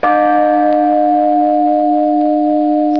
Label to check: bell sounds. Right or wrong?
Right